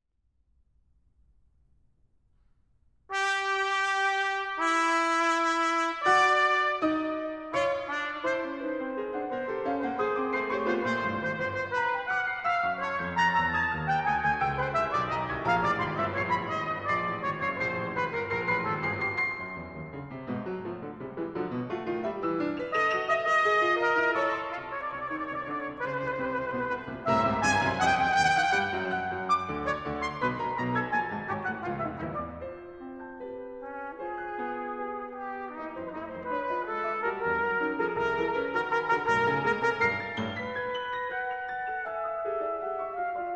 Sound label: sonata for trumpet and piano allegro moderato